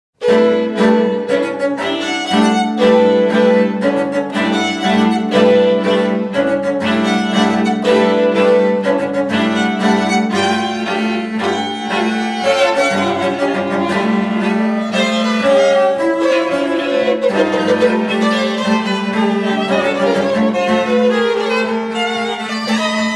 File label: String Trio; No. 1; mvmts ii and iii